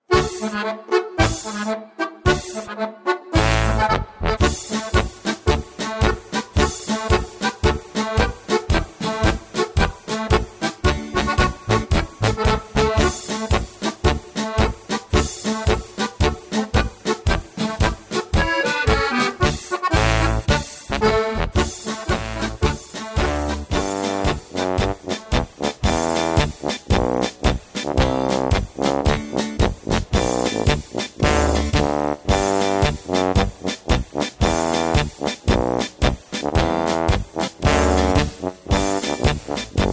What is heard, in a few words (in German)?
Marsch